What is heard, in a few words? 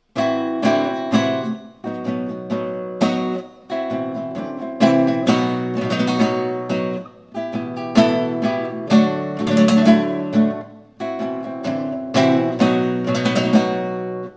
tangos A type